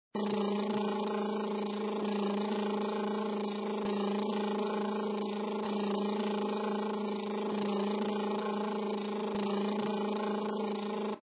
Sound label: Plane Sound; Feed Fribbit Colors